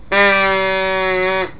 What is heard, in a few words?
handmade reed